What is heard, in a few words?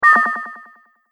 Multimedia Bleep With Delay